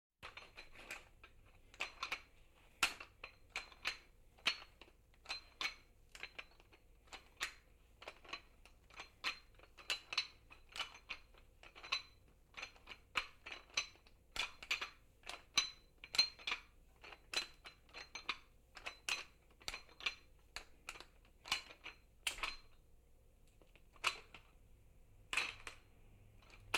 Sport Training Mit Gewichten

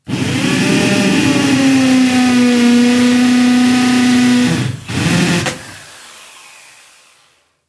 Schlagbohrmaschine

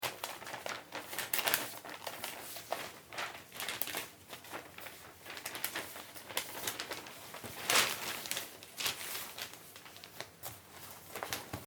Ringordner durchblaettern

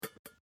Deckel

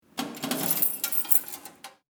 Briefkasten oeffnen

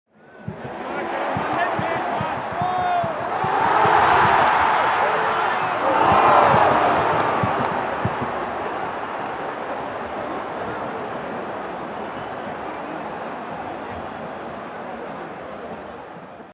Stadion Fans und Gesaenge

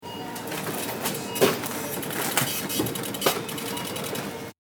Supermarkt Kassenausdruck

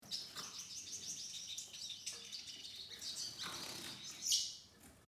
Voegel

